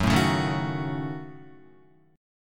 F sharp Minor 6th F sharp Guitar; F#m6 strummed

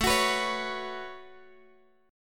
A 6th Add 9th, Guitar, Drop D; 19,21,21,18,x,x

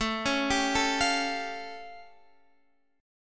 A Augmented 7th A Guitar Standard; arpeggiated; x,12,11,12,10,13; JGuitar

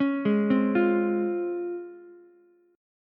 Db Major 7th Db down Ukulele; DbM7 arpeggiated; JGuitar

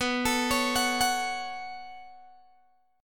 B 7th Suspended 2nd, Guitar, Open G

